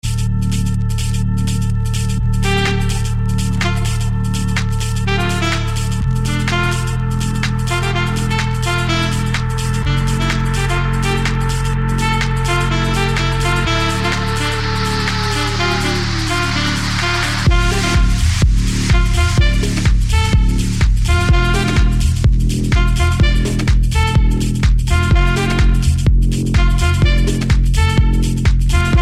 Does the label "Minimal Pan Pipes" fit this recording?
Yes